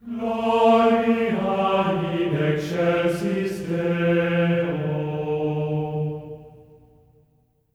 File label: GLORIA D#4, Symphony of Voices; Spectrasonics; GR GLORIA